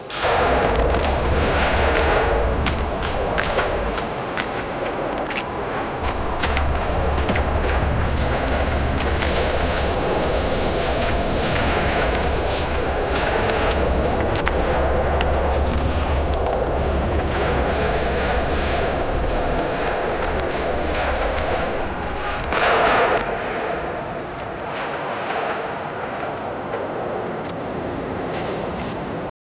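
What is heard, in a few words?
ambient sound of 1453 ottoman; epic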